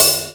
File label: HiHat Open; pitchglitch samples; BJAPacific